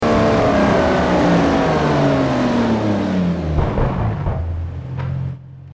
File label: throttle off; TDMCarpack; TDMCars